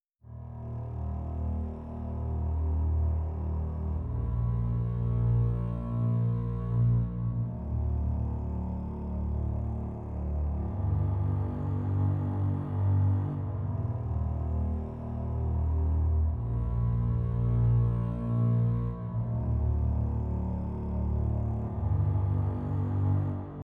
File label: Music Suspensful Cello